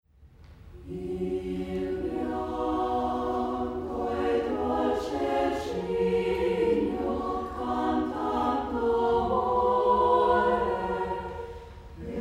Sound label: garnet singers sing arcadelt